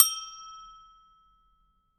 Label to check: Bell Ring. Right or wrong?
Right